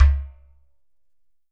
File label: GHATAM